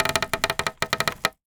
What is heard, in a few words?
Polystyrene Creak